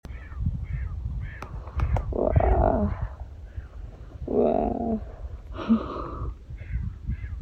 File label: guaaa